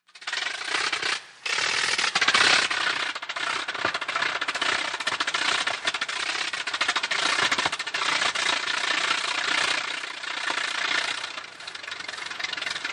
AIRCRAFT PROP ANTIQUE SINGLE ENGINE: EXT: Start, idle, sputtering, off.; ww2 fighters